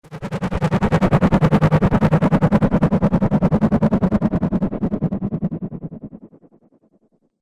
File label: Strange Radioactive Fallout, explosion; Battle Sound Effects; Battle Ambience